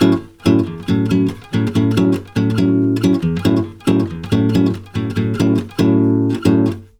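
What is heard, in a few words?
bossa A, 140 major chord; USB Soundscan; AKAI; Brazil Bossa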